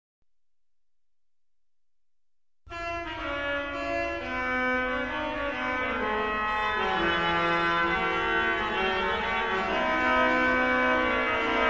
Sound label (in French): Fugue, orgue; Couperin